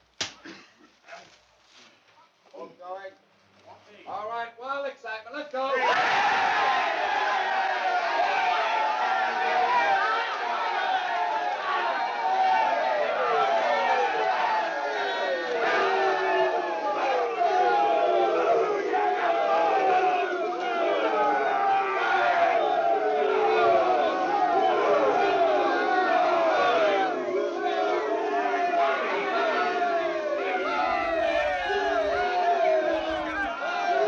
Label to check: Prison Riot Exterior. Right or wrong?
Right